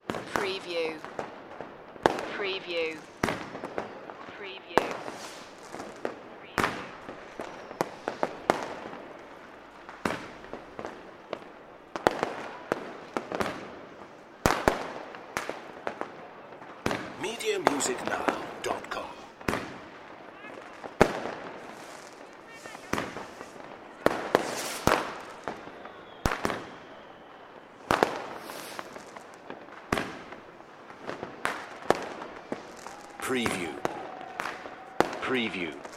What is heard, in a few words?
fireworks ambience; the sound pack tree